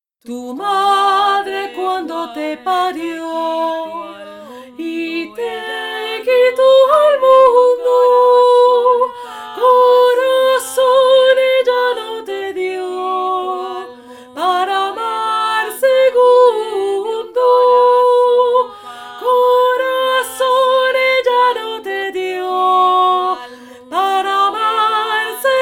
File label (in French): VOIX AIGUE